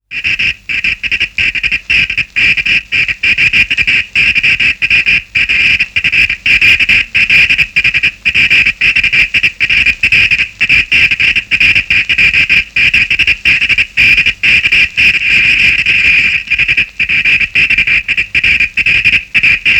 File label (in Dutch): vonkzender